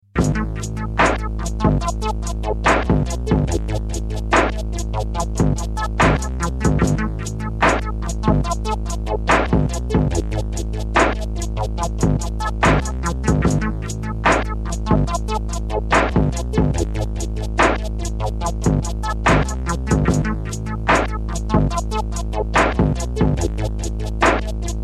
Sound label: beat sketch